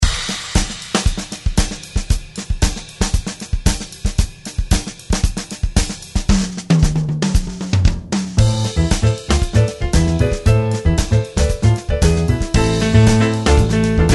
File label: Latin Trio